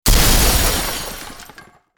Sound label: Crash Metal and Wood